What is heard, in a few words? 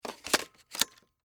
HD Camera Insert, Hd, Camera, Insert, Battery, Sony, Hvr-Z5; Technology; Cameras